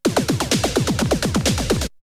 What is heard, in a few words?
Drumloop; ZERO G - Datafile DISC 1